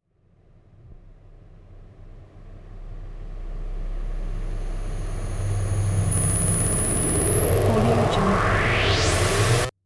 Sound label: riser